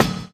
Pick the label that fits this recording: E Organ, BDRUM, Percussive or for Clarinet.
BDRUM